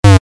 ピウ レトロ モノラル

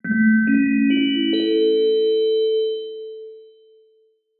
お知らせ 始まり リバーブ有り; 戏剧音效

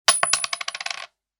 コインが落ちる音; 場面切り替えや心理描写; 演出効果音

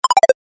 キャンセル 中音 レトロ

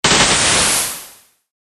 ダッシュ モノラル

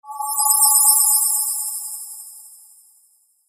回復魔法; 魔法効果音; RPGなどに使える効果音です。

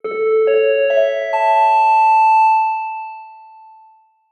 お知らせ 始まり リバーブ有り; 戏剧音效

